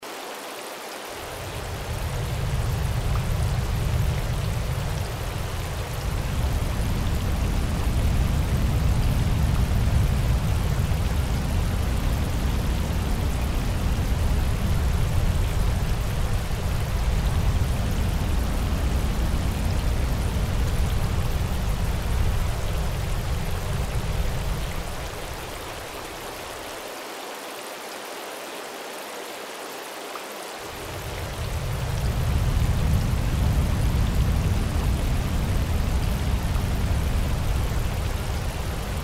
雷雲 雨有り

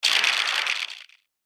ページめくり

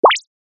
ぴょこ

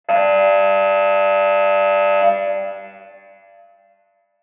劇場やホールの開演ブザー 短め リバーブ有り; 「演劇・舞台」の効果音

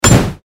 斧がささる; モノラル

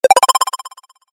決定 中音 レトロ モノラル

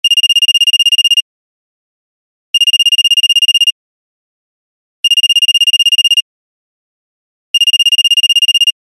着信音

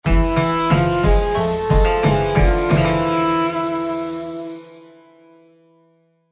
アラビア風の アイキャッチ; 商用利用可能な無料のアイキャッチの楽曲です

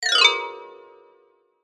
場面切り替え 木琴 下り

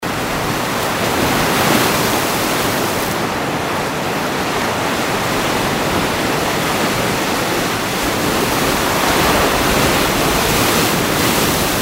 自然の海の音; Nature and Field; Nature and Field Sound Effects

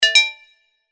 キコッ 木琴 音高め

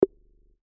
ポン コミカル

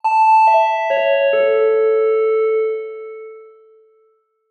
お知らせ 終わり リバーブ有り; 戏剧音效

